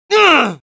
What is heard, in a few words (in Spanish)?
pain sharp; Voice file from Team Fortress 2 Spanish version; Scout audio responses/es